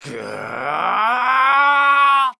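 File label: Mon Man ATTACK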